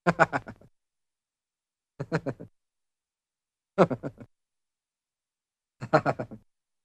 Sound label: Man Chuckles; Cartoon; Hollywood Edge; Cartoon Trax